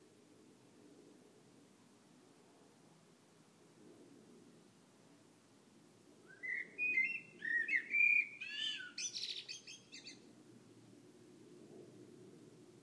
birdsong, 10pm Sunday

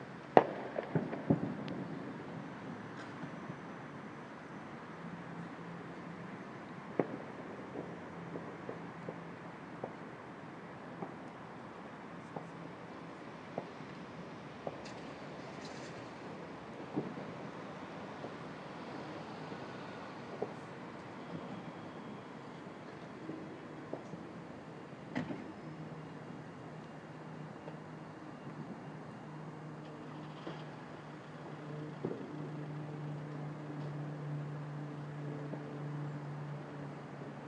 distant fireworks

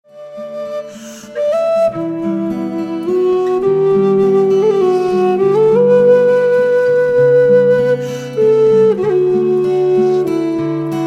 jig; mhran a leaghair; monaghan jig

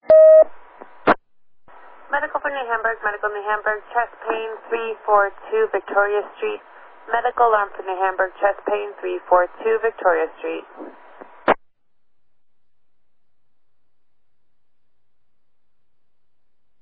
medical page received; new hamburg

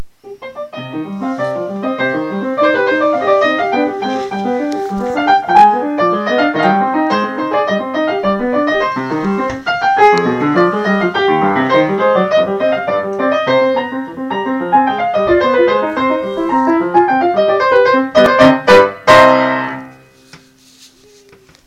piano practice

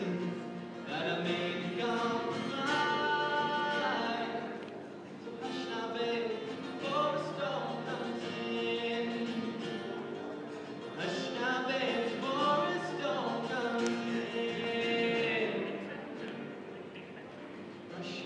folk singer; glanfa stage; live music now

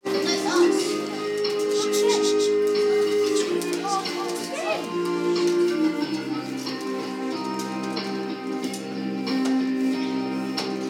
class performance chant

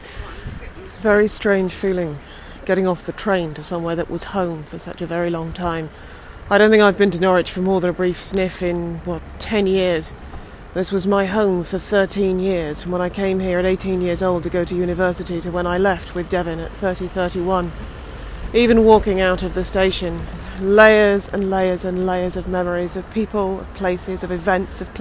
norwich boo

